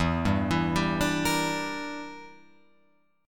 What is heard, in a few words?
E Minor 7th Flat 5th, Guitar, Open F; Em7b5 arpeggiated